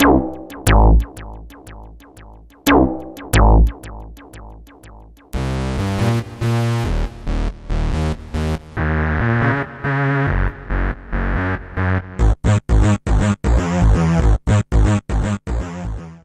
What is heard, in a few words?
phat bass lines